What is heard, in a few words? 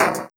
Spitter Kick, Esoterik Beatz; Spitter Kit